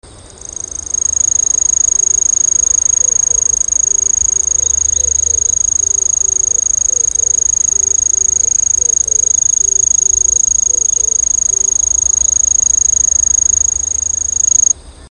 kusahibari, insect

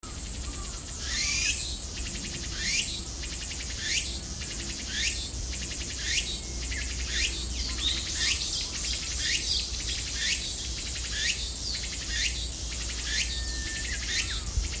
tukutuku, insect

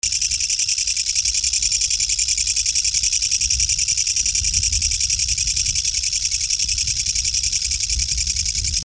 kutuwamusi, insect

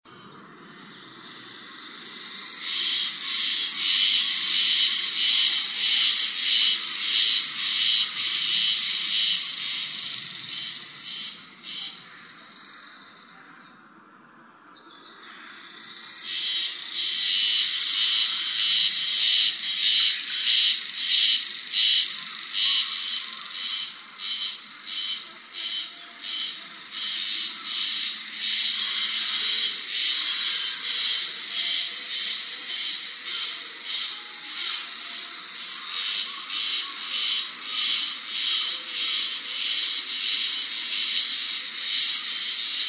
haruzemi few, insect

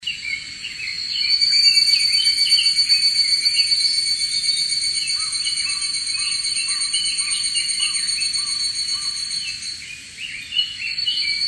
higurasi; insect

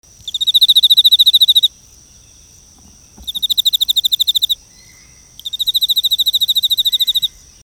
enmakorogi; insect

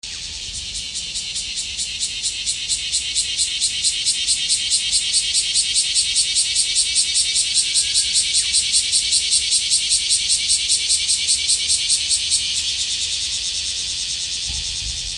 kumazemi solo; insect

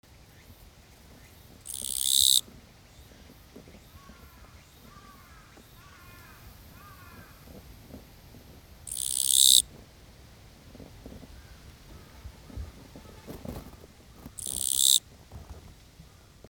kirigirisu; insect

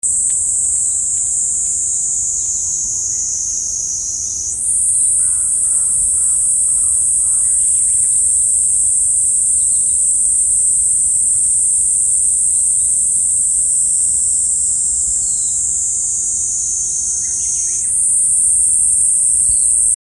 niiniizemi, insect

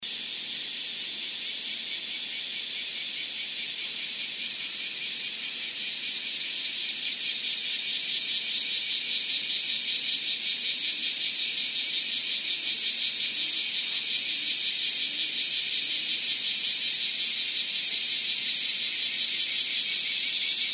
kumazemi; insect

